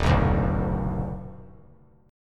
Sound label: Eb Minor Major 7th Sharp 5th Eb down, chord; EbmM7#5; strummed; JGuitar